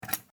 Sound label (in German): Ringordner Verschluss